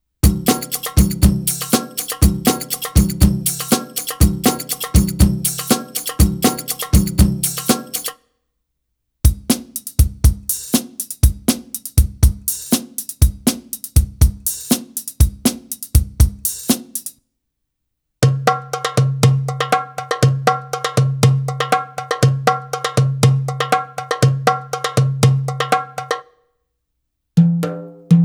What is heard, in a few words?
Arabic Rhythms